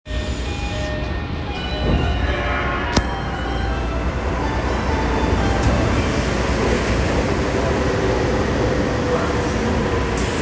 有楽町線平和台東, train sounds

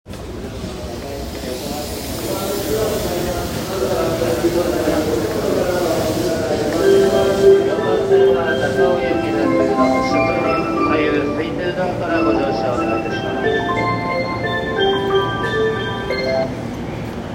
中央線快速八王子西, train sounds

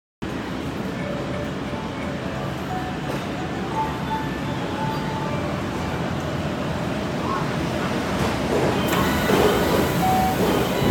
西九条発車, train sounds

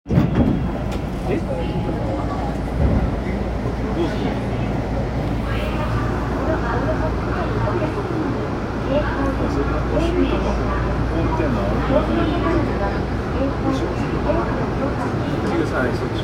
半蔵門線水天宮前東

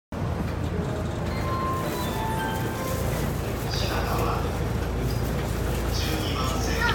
JR東海道線品川南, train sounds

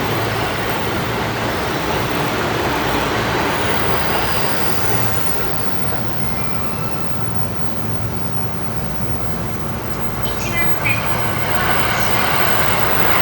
京浜東北線川口南, train sounds

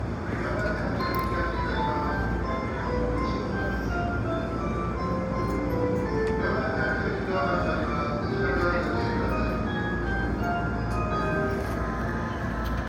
JR湘南新宿線横浜北

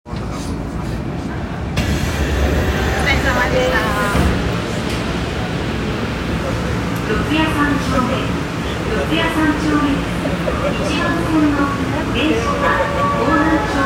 丸ノ内線四谷三丁目西, train sounds

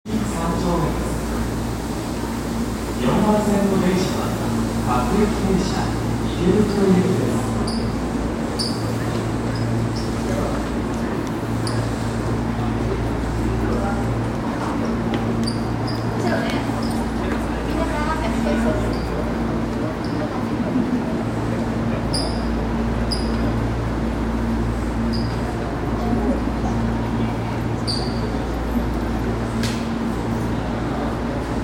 副都心線新宿三丁目南

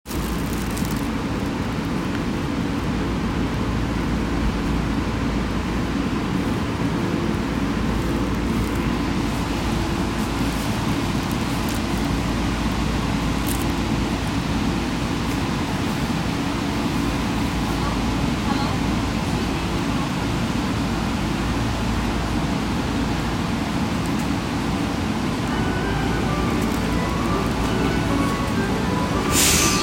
銀座線渋谷東, train sounds

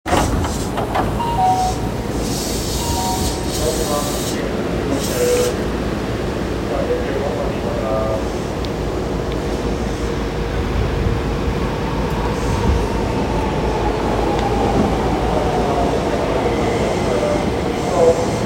京急本線平和島南

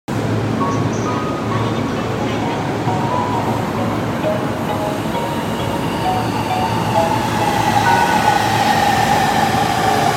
JR 常磐線 松戸 北, train sounds

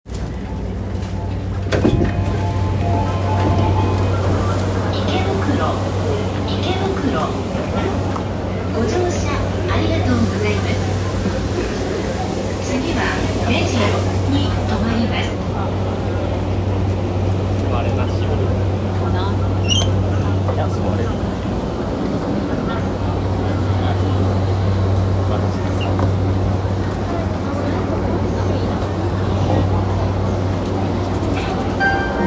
JR山手線池袋CCW, train sounds

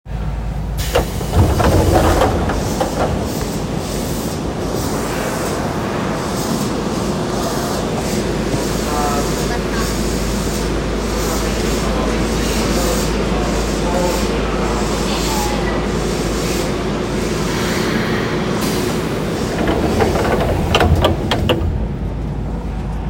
京急空港線大鳥居東, train sounds

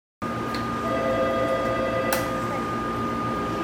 JR外房線本千葉北, train sounds

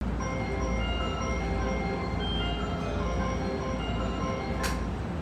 中央・総武線平井西, train sounds

